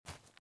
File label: run grass